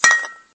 bottle

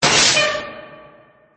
animal

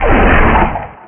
menu prev

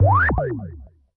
menu next

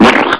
bomb hit

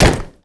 hit

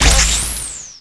bomb fire

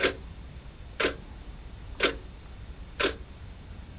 siren loop

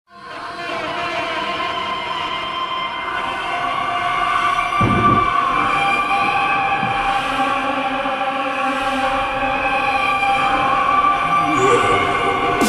thunder nightmare; cumulclouds

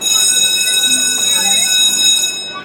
town bell

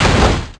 hit

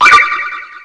countdown; tetrisfest

